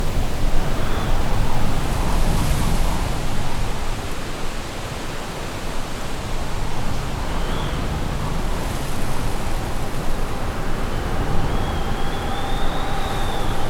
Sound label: Forest - Ice Forest; Free Pack